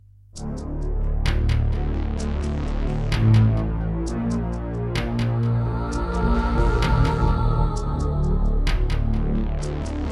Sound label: Yamaha DB50XG GSMODE; Descent II